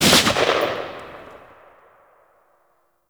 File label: Grineer M16 Rifle Tail; Weapons